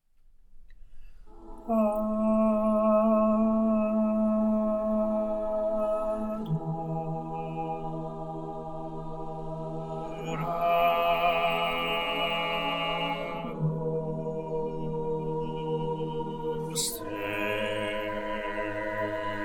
Adoramus te Bassi, coro

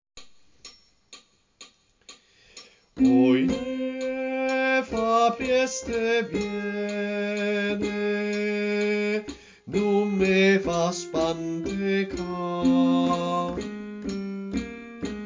Soprani; Coro Isola del Giglio

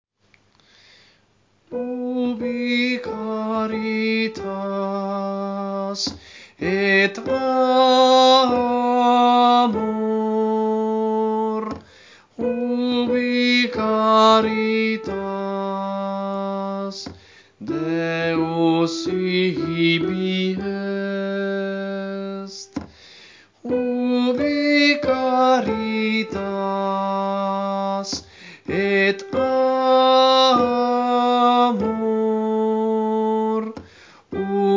Soprani, coro; Coro Isola del Giglio; Giovedi Santo; Ubi Caritas

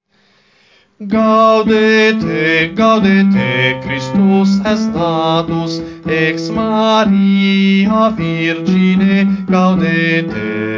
bassi; Coro Isola del Giglio; Gaudete

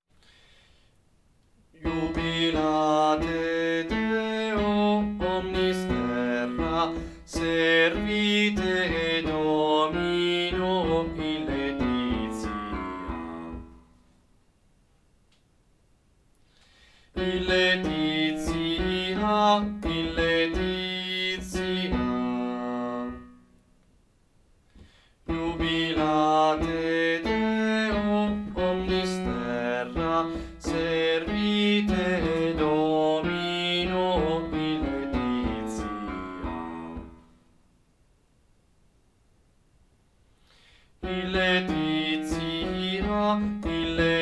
bassi, coro; Coro Isola del Giglio; Natale; Jubilate Deo

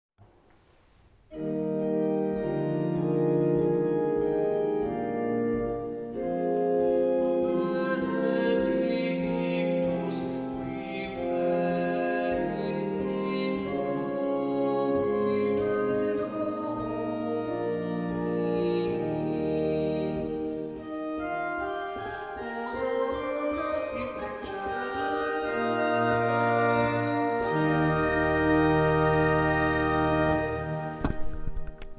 Benedictus. Soprani e tenori, coro; Coro Isola del Giglio; Messa di Bottazzo

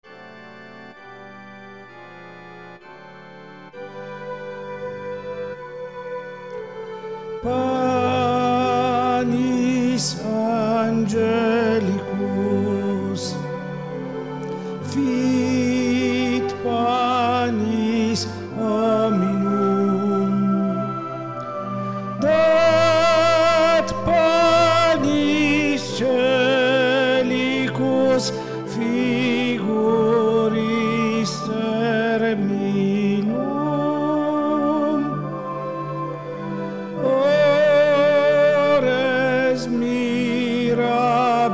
panis soprani coro; Panis Angelicus

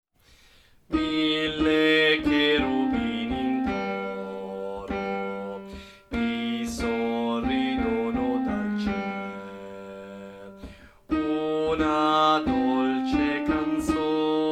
bassi, coro; Coro Isola del Giglio; Natale; Mille cherubini in coro

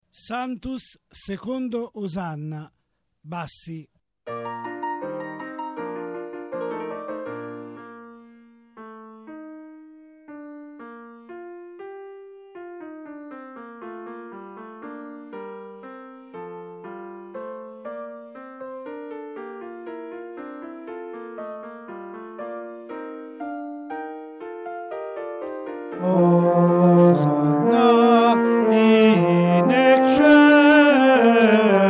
secondo osanna bassi; Requiem

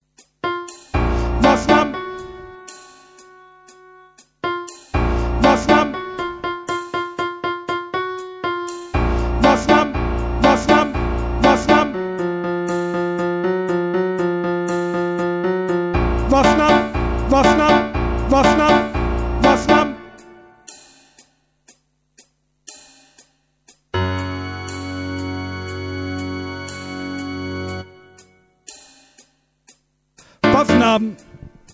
tenori; Carmina

